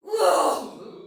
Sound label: Death Soldier Female, Battle Barks; Dialog; Sinecure